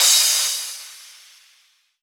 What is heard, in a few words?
Crash, Cymbals